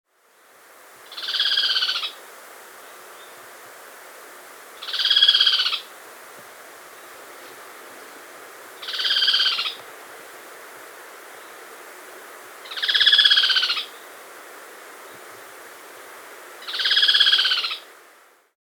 Striped Treehunter (Thripadectes Holostictus), Andean Birds; S. America